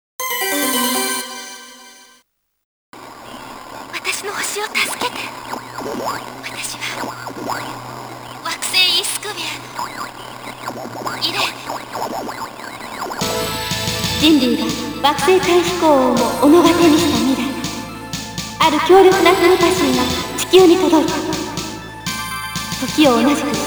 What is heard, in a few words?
Coin Sound ~ Coin Demo BGM; Think Tank; Konami Arcade Shooting